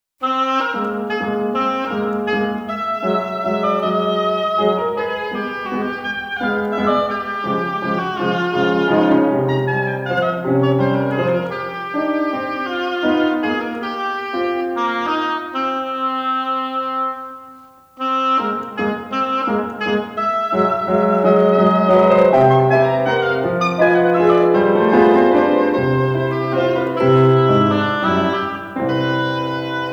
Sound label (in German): für Oboe und Klavier - Walzer